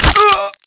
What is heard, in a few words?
fall pain; Team Fortress 2